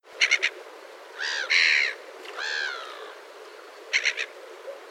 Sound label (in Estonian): naerukajakas; summer